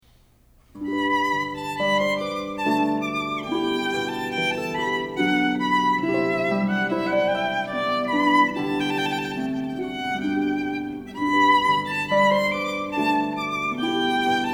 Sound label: Hornpipe, harp violin; Purcell